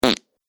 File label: Fart Sound; human